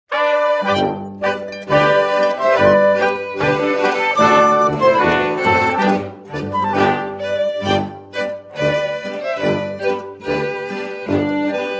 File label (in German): Kuckuckspolka